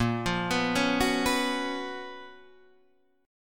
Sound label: Gb Major 11th